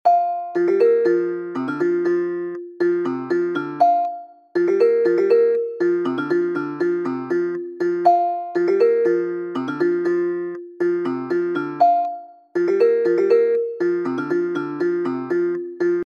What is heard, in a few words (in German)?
klingelton; lugano